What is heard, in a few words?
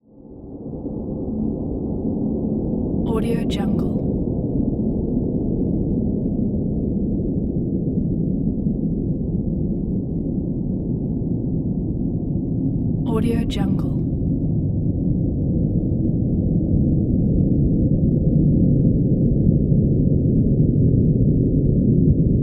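ambience evil lair; prosoundfx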